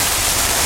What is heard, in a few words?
spray